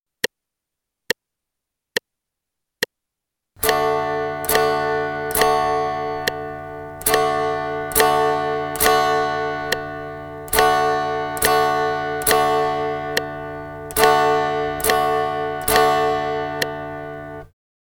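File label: G strum quarter notes pattern 2 D, guitar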